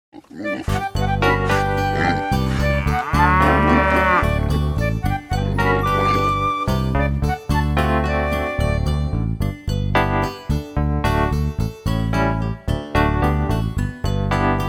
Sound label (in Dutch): koetje en een kalfje instrumental; lesmateriaal; songbook